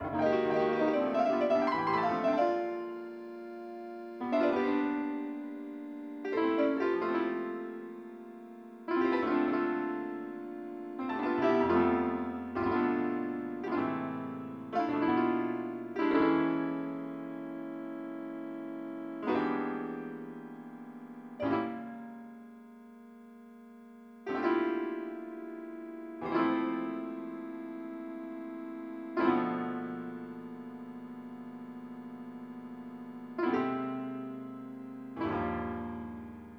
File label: piano